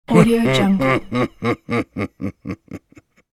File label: male sinister laugh; sound-ideas